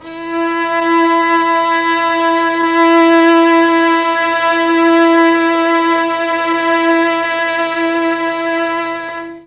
E4 300 VIOLA, mellotron, STRINGS

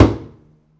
pizz noise body slap, double bass

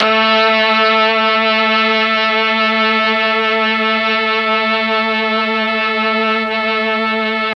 A2 brass, mellotron; MKII BRASS

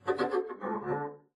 double bass arco noise jumpy

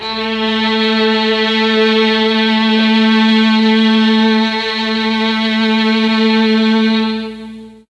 A1 3 violins, mellotron MKII

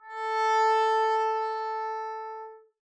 arco a4 mf down, double bass

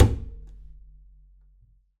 perc, pizz; meatbass

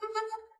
arco noise jumpy high, double-bass

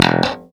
e2 staccato, glowly bass

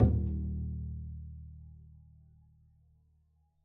pizz eb1, double bass

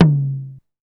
Tom, Sequential Circuits Drumtraks; drum machines

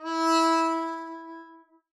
arco e4 f down, double bass

